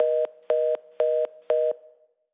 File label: Hang Up; blue archive